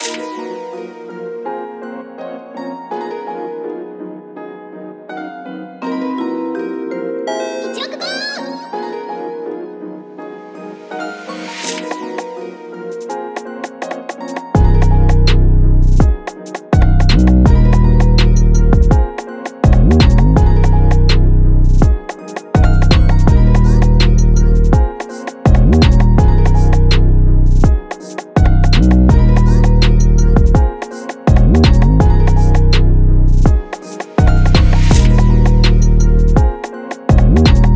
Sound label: Gm BPM165